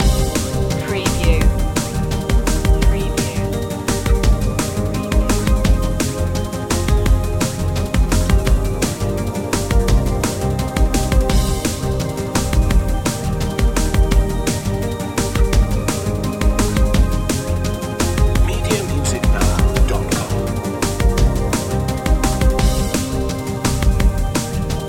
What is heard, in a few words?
uk chillax loop